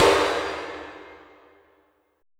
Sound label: SMASHED, FILM PERC; Drums In Your FACE!